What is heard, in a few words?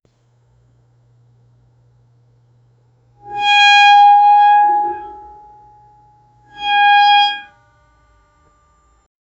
metal bending